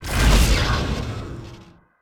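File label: Weapon sonic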